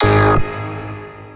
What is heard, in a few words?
Stab; neuro; InsideInfo